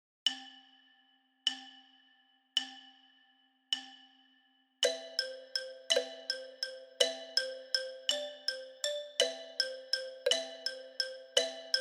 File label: Xylophone